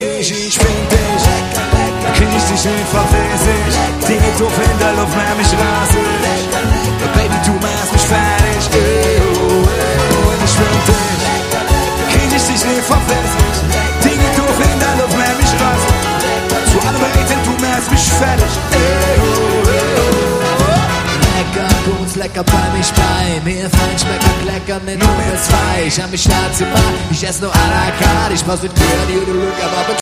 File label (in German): live mit orchester